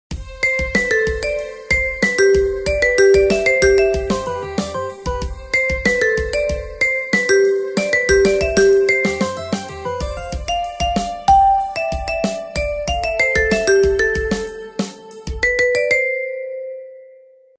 Cheerful, ringtones

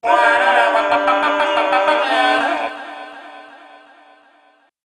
Quirky Smile

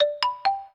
Doorbell, notifications; Meizu X8

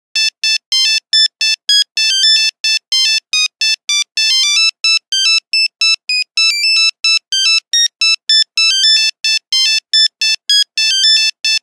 Nokia Buzzer; 1100; Windows Phone apps; Espionage

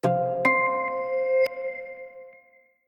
Reverse; Newman CM810

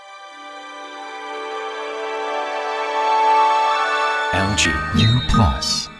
Power On sound; LG uplus; Samsung Galaxy U SHW-M130L

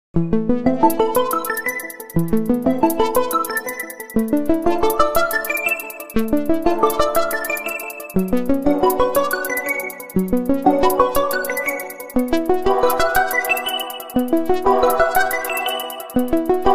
Electric, Nokia Tones; Windows Phone apps

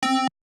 dial tone

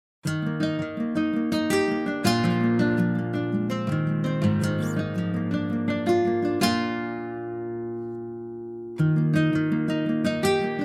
Double Guitar; Tecno; Pop 9 4G

